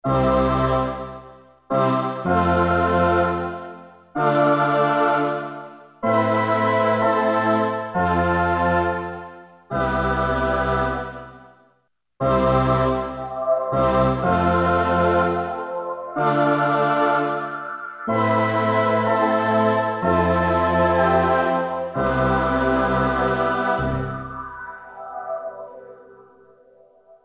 PANNER DELAY, Boss SX-700; Factory Preset

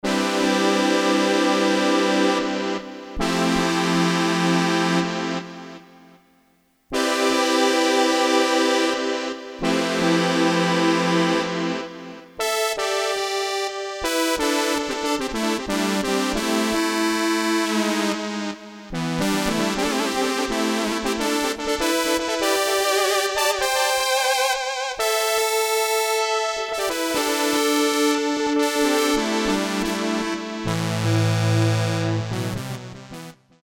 BRASS, Korg DSS-1 DWGS synthesizer presets; Korg DSS-1 Sound Library

